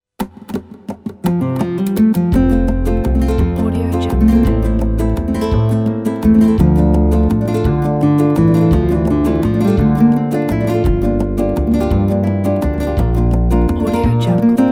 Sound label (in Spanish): bella flamenca